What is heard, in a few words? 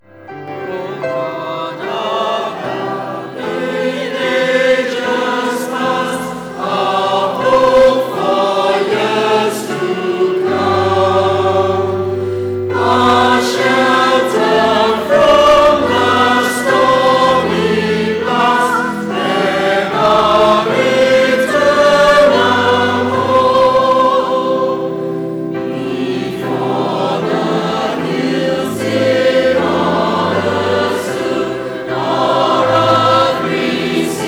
English Choir; Combined SVC; Presentation Part Two